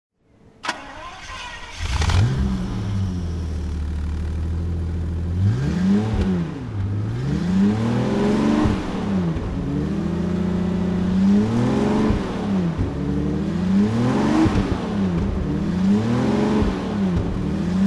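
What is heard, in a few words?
jaguar xjc v12 throttle, Engine sound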